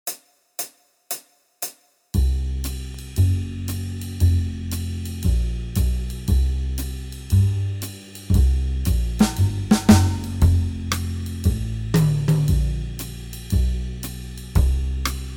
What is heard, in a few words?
Jazz backing; Guitar Techniques 2018 Issue 289 December